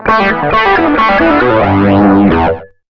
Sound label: SOLO R, Electric & Acoustic Guitar Loops; USB Soundscan vol.04; AKAI; 120 SOLOS